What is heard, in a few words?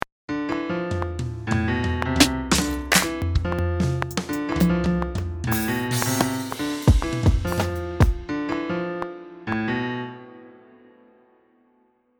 piano